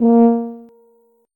tuba note

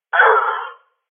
death

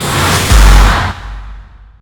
electro impact combo, weapons

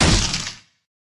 impact, weapons

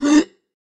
jump

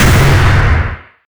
hagexp, weapons

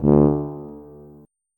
tuba note

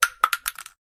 tink

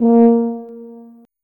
tuba note

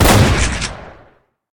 shotgun fire, weapons

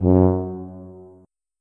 tuba note

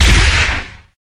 crylink fire, weapons

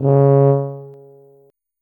tuba note

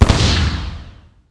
fire, weapons

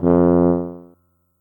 tuba note

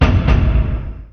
armor

